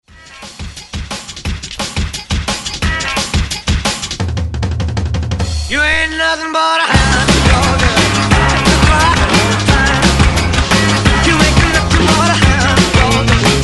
50s ReDrum 89 Bpm; DJDOLLS

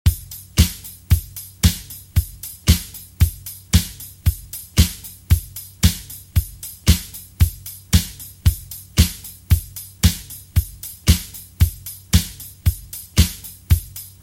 70s Dj Intro Outro, 114Bpm

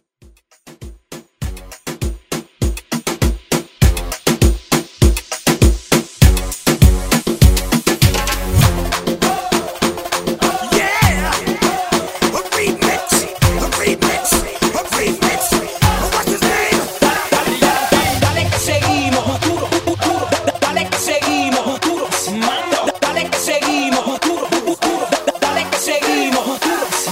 Reggaeton Reffixx

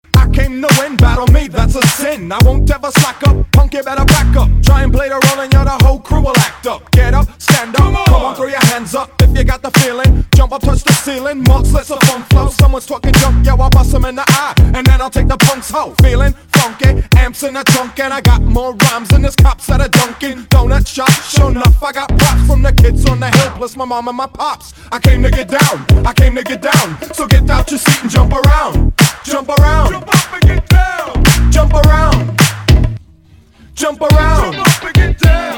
Club Party MashUp

